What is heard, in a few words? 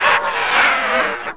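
scream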